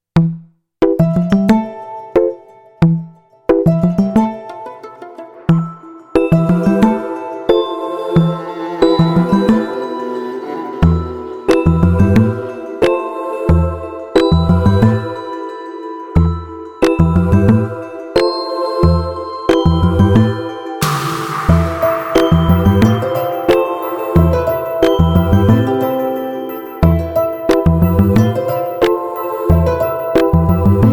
Karaoke Version

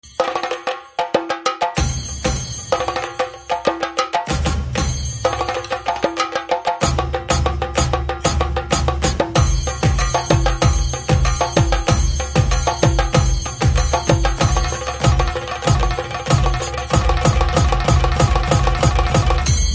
Tabla

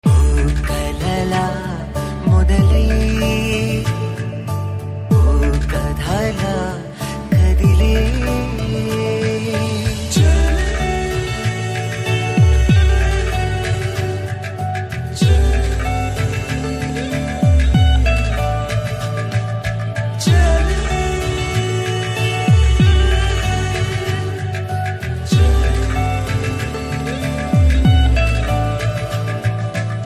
Ringtone

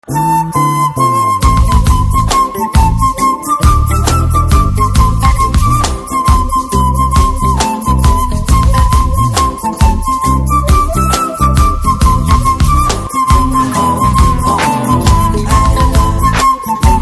Anthem BGM Flute Ringtone

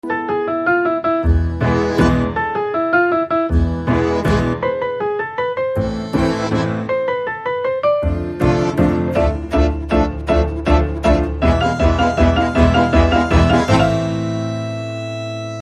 BG Piano

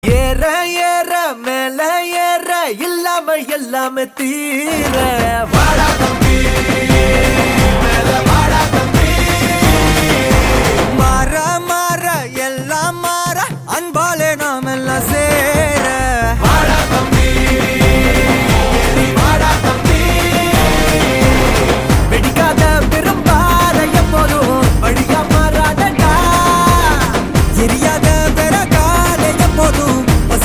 Theme Ringtone; Etharkkum Thunindhavan

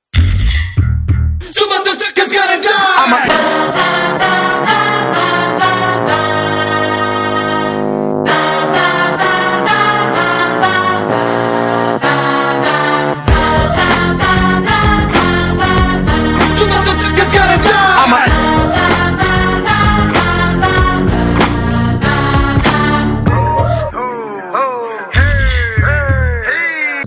Anthem Theme Ringtone; Ghani

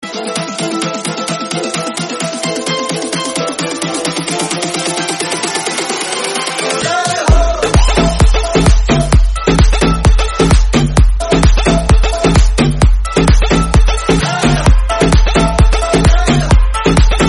Instrumental BGM Ringtone; Slumdog Millionaire

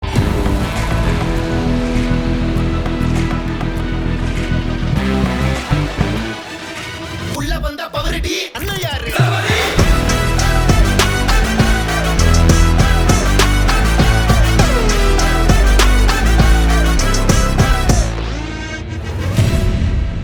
BGM Ringtone

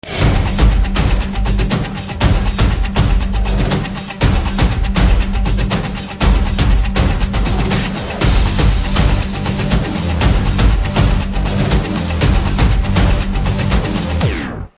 BGM Drum Beats Ringtone; Liger; Hunt

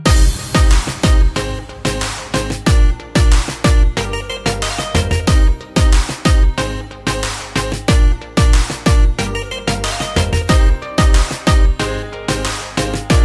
instrumental tone

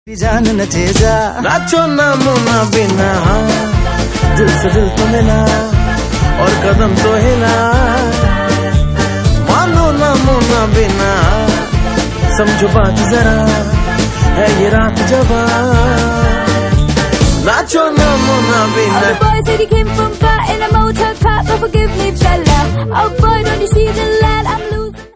ringtone

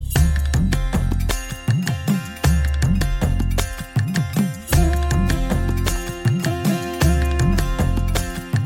BGM; Jai Bhim

